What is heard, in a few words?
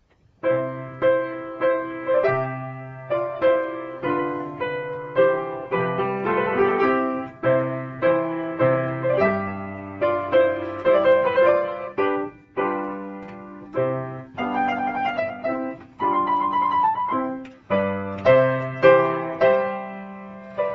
Vedrai Carino piano